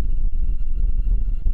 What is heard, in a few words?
tron bike